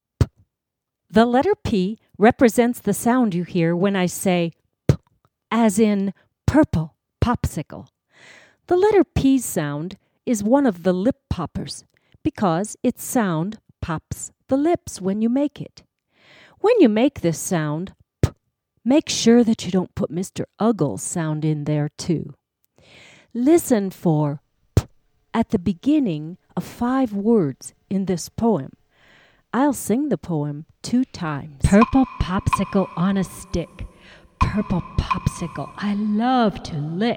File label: Wood Block